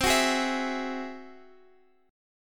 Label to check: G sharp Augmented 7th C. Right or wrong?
Right